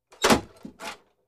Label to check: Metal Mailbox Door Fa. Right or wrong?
Right